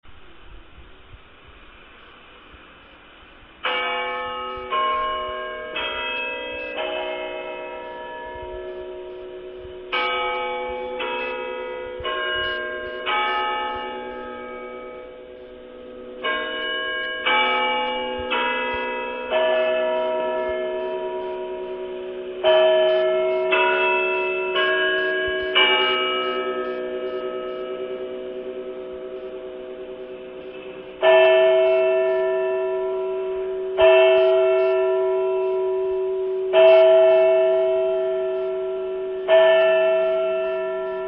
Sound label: bells